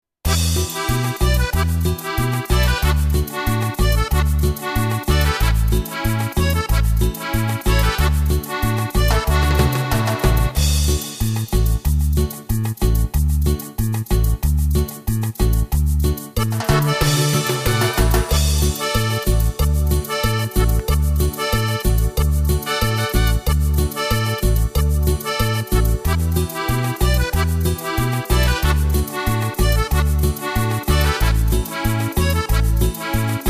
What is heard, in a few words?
Huaracha, Latin America